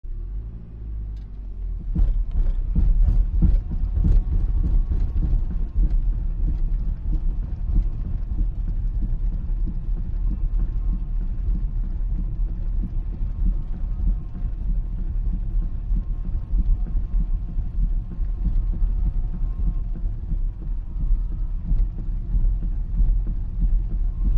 Car Tire Flat Start

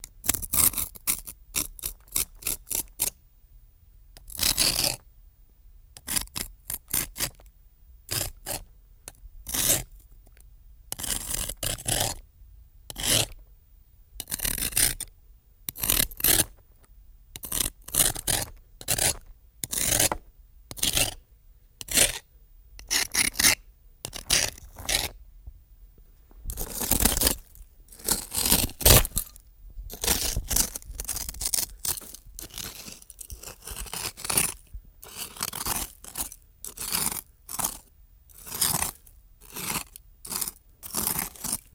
Knife Scraping On; knives

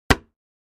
Gallon Jug Hit Hammer, plastic

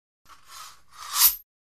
Fencing; Swords Rub

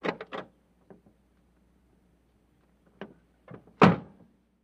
Car Door Oc Large Door

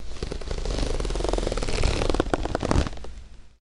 Denim Jean Zipper; zippers

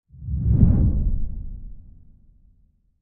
Bass Impact Whir Syn; impacts

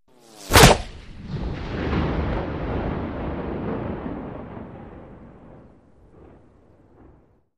Bullet Whizzes By Big

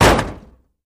Car Old Rusty Door Sla

